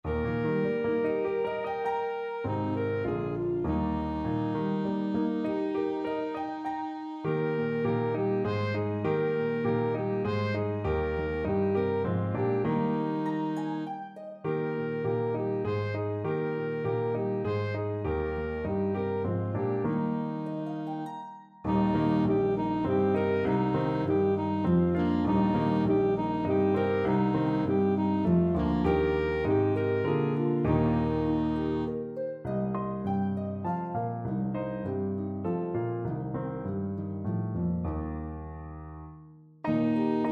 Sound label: Trio Alto Saxophone; Come Shepherds Rise